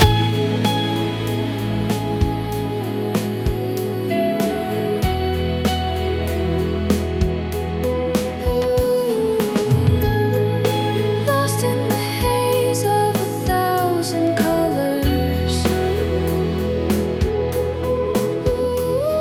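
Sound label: music; AceStep Base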